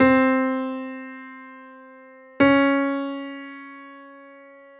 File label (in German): kleine sekunde, gehoerbildung